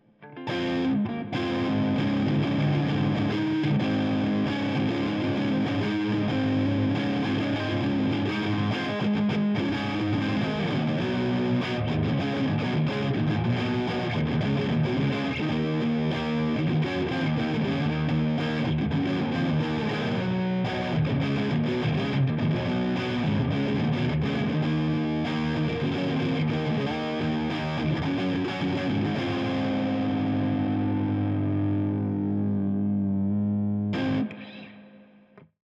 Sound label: GTR DI Test; 97 Percent Fire Studio